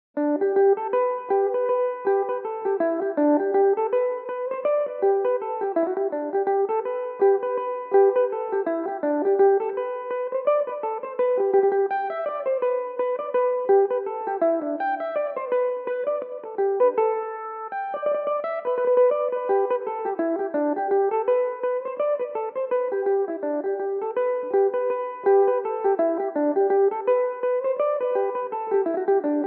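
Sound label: reel G major belmuse, irish mandolin; The Ballygow Reel; Belmuse EM-190 electric mandolin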